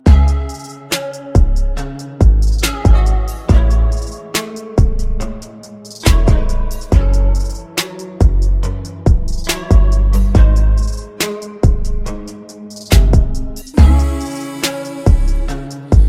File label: Instrumental